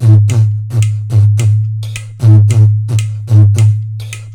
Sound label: MATKA, Indian Traditions; USB Soundscan vol.45; AKAI